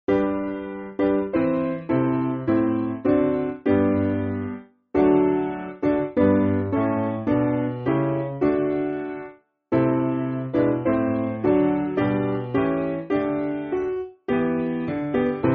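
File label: Piano, Music Backing Files for Churches; Thine For Ever; Newington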